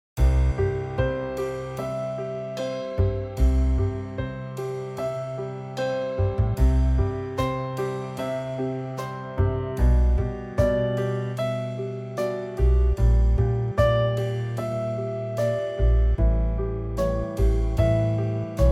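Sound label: trio C, Backing Tracks for Male Vocals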